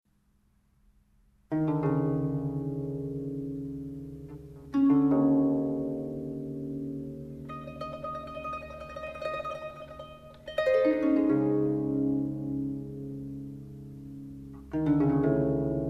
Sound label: Improvisation and Pavane, harp